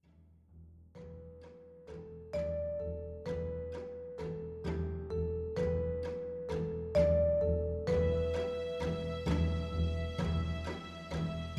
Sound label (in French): penta cordes, Pour Ensemble à Cordes et Percussions